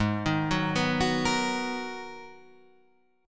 G sharp Minor 9th G sharp, Guitar Standard; G#m9 arpeggiated; 4,6,4,4,7,6; JGuitar

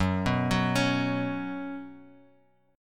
Gb Suspended 4th, Bass Standard; arpeggiated; 14,14,16,18; JGuitar

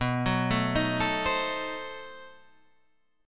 B 7th Flat 9th B Guitar Half Step Down

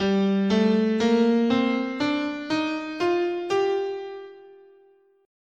G Aeolian, scale

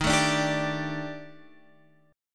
D sharp Major 7th Flat 5th, down, Guitar, Open F; strummed; JGuitar

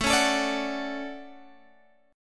D Major 7th, chord

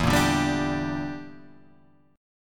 E Minor 7th Flat 5th, Guitar, Half Step Down

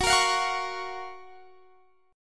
Gb Major 7th, down, Guitar, Standard; strummed; JGuitar

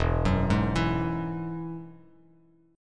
E Major Add 9th, E, Bass Standard; arpeggiated; JGuitar

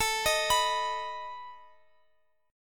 A Suspended 2nd Flat 5th A down Guitar; Asus2b5 arpeggiated

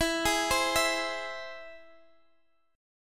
E Augmented E; arpeggiated; x,x,14,13,13,12

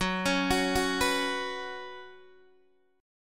Gb Suspended 4th Gb, down, Guitar, Open D

